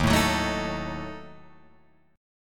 Eb Diminished, Guitar, Open F; Ebdim strummed; 3,4,6,4,6,4; JGuitar

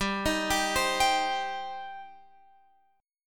G Suspended 4th Sharp 5th, Guitar, Open F; Gsus4#5 arpeggiated; x,14,15,14,15,14

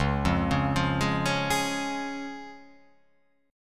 C sharp Major

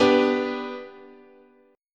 B Minor Sharp 5th, guitar chord

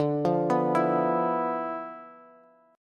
D Minor 6th Add 9th D, Bass; Dm6add9 arpeggiated; JGuitar

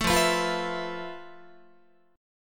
F Major 7th Flat 5th Guitar; strummed

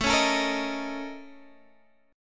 Bb Minor Major 7th Double Flat 5th

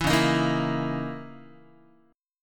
C sharp Minor 6th Add 9th C sharp, Guitar; C#m6add9; strummed; JGuitar

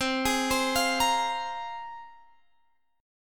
C 7th Suspended 4th Sharp 5th, Guitar, Open G

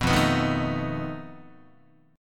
F 7th Flat 5th F down Guitar; F7b5 strummed

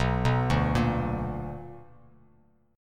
B Minor Major 7th, down, Bass Standard; arpeggiated; JGuitar

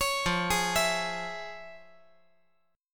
F Minor Major 7th Sharp 5th, F

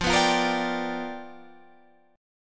G 7th Sharp 9th F chord

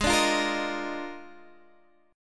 Eb Major 7th Suspended 2nd Suspended 4th Ab

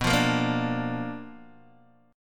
B Minor Major 7th Flat 5th Guitar DADGAD

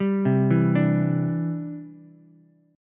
C Major Add 9th, Ukulele; arpeggiated; JGuitar

